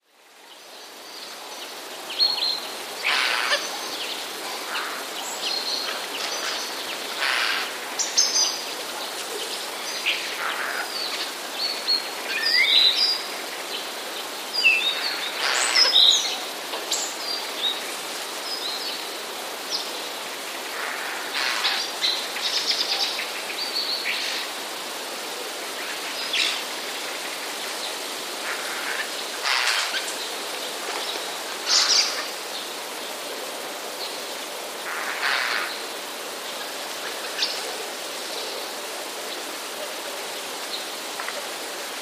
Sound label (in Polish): sojka; sójki odzywające się chrapliwymi, często zawiniętymi do góry głosami